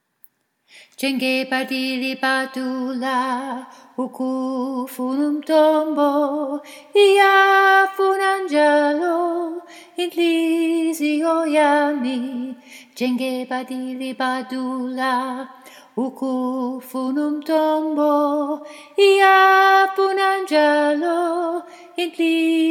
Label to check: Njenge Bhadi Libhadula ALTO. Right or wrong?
Right